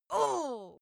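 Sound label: female pain, screams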